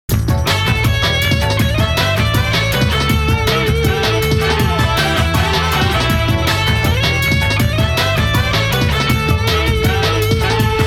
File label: jingle; Sonic Adventure; Unused